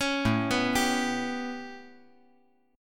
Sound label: A 9th A; 6,9,7,10